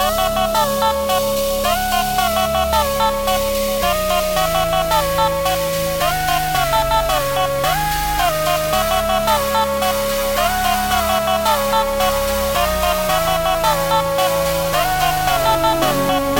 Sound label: Kit Loop Full